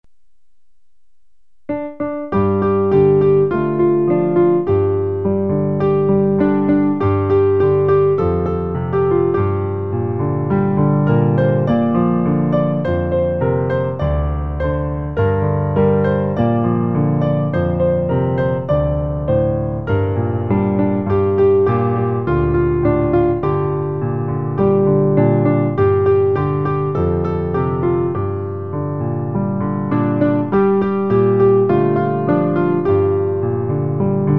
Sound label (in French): piano